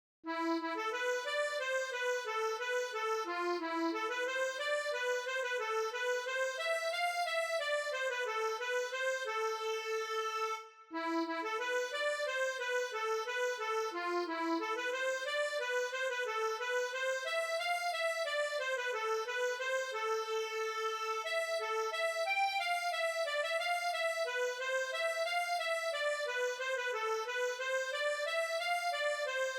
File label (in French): gavotte, voix1